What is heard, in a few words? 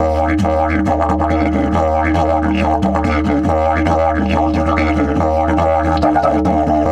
EF 140, DIDGE RIFFS; Big Fish Audio - Didgeridoo and Other Primitive Instruments